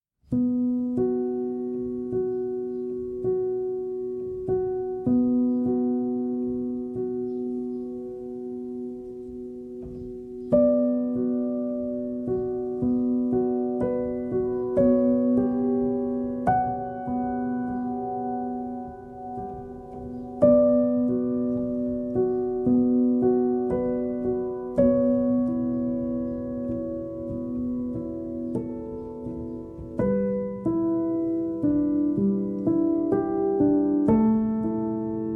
Felted Piano

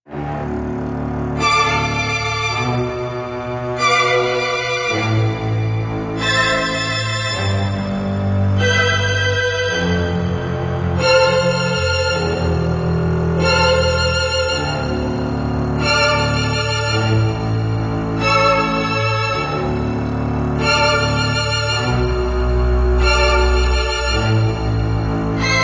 Quintet

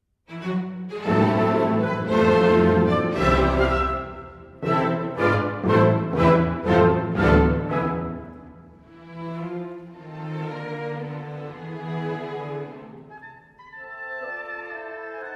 Piano Concerto in A Minor, Allegro maestoso

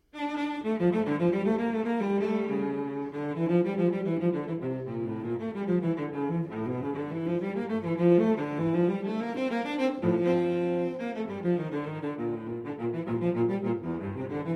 Cello Suite in D Minor Courante; Cello Suite No. 2 in D Minor, BWV 1008; III. Courante

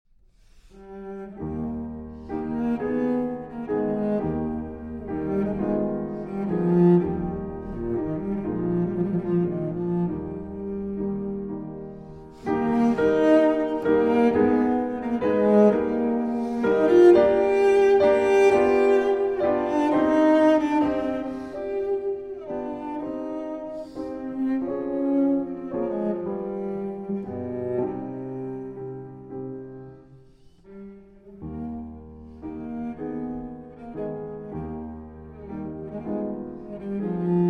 Sicilienne (Transcribed For Cello & Piano); Paradis

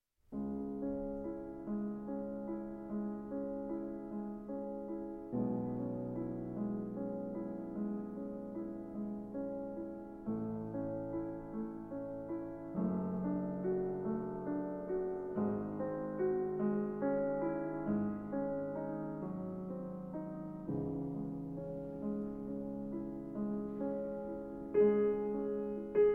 Piano Sonata No. 14 in C-Sharp Minor

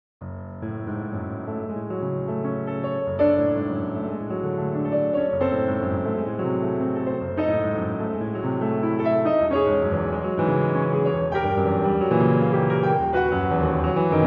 Piano Pieces; Op. 76 No. 1, Capriccio in F-Sharp Minor

